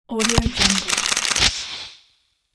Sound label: automatic camera shot